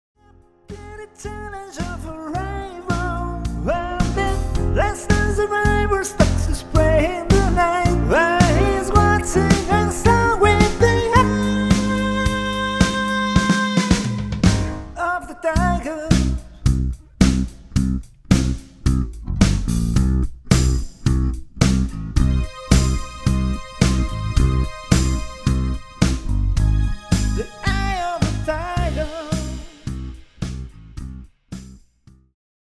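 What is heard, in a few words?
without Guitar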